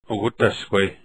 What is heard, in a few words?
throat